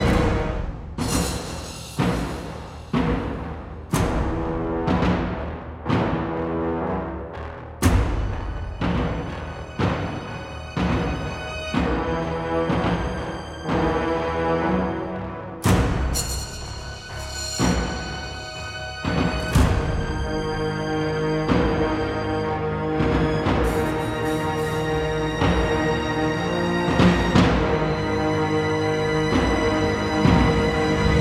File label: Action FULL Loop 123bpm, Classic Horror Sci-Fi Music; Dead Ark